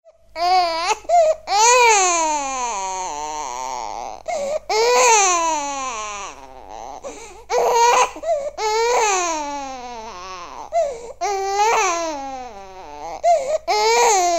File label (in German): schrei